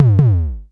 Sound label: Klone Dual Percussion Synthesiser tom